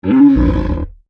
Monster Grunt, Horror, Monster, Grunt, Creature; Monsters; BlastWave

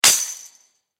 Impact Fence Metal Hit Strike; Sound Effects:Materials; Blastwave

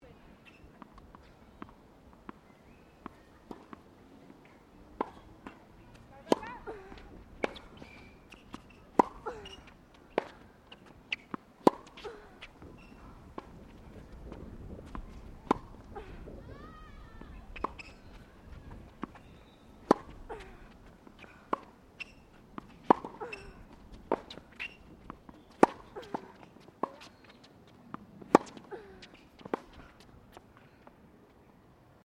TENNIS AMBIENCE FEMALE MATCH, Local tennis club; Sports

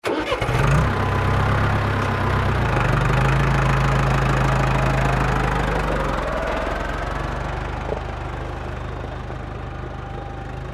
Tractor Farm Start Away Distant Working Off

